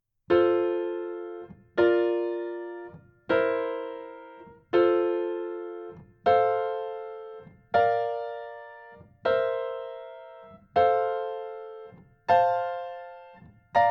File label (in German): Kadenzen in F Dur Übung